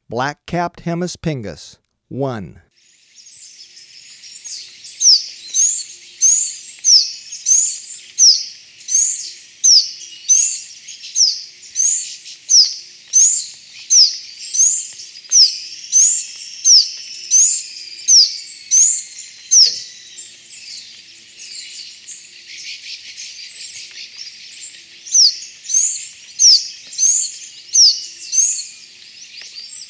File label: Black-Capped Hemispingus, Birds of Ecuador; S. America